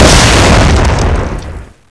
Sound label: explode